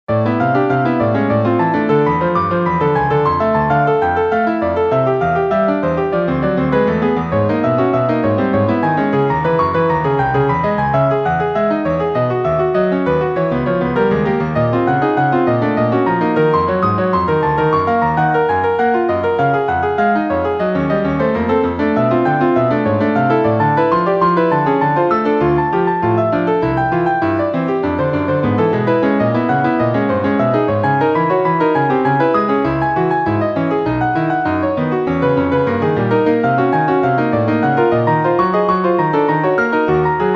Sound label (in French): piece pour piano; vignon denys